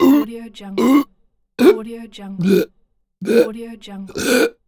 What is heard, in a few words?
tonal talking burp